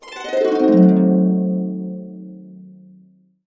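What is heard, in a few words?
C diminished 7, harps; USB Soundscan vol.62 - Complete Harps; AKAI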